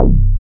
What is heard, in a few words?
BAS, Eurotech; Sounds Good